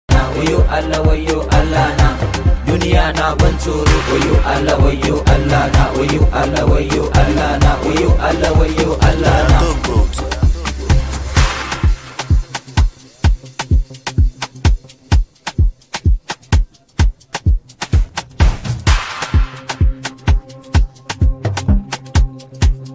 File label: OPEN VERSE Instrumental BEAT HOOK